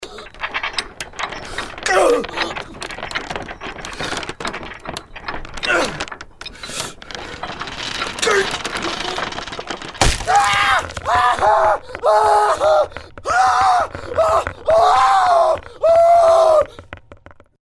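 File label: horror, person on the rack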